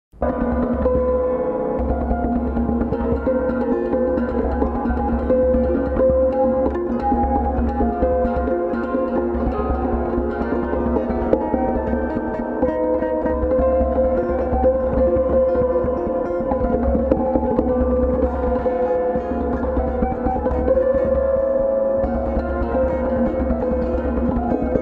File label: Drumming the Harp